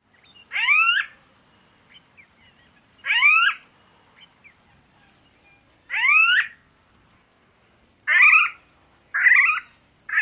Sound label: calls